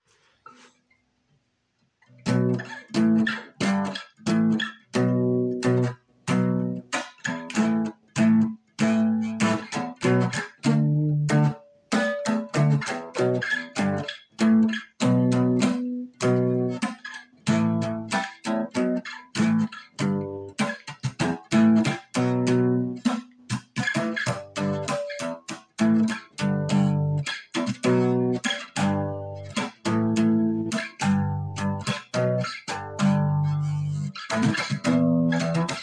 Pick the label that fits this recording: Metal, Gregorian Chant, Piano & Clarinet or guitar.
guitar